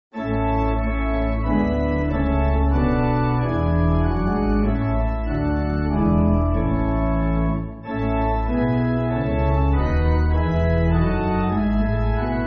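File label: Pipe Organ, Music Backing Files for Churches; Lindeman; L.M. Lindeman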